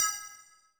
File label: METL VRB